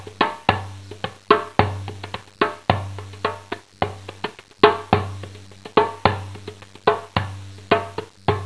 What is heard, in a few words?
dumbek